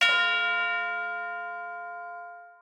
bell

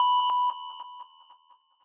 lead

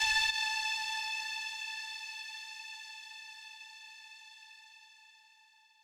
lead3

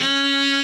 cello

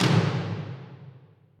perc

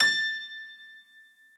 piano

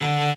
cello

